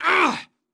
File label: hit; NWNDS; nwnds audio